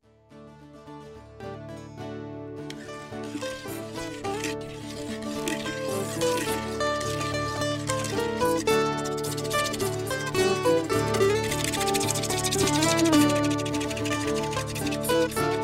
orquestra

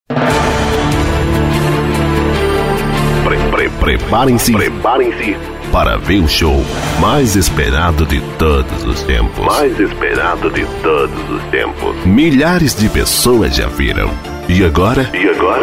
abertura

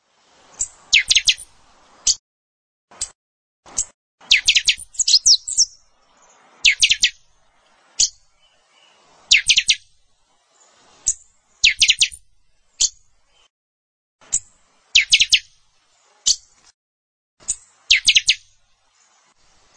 canto do pixoxo

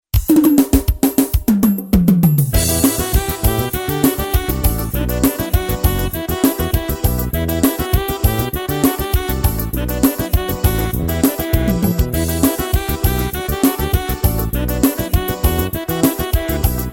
forro

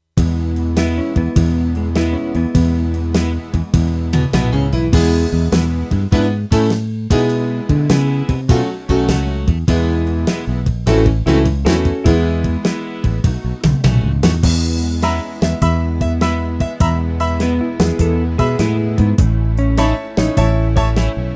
amostra blues; nelson midis

